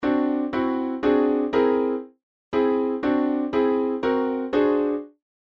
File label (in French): accords gamme par ton